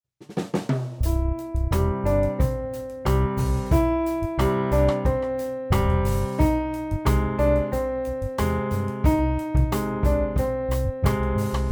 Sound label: piano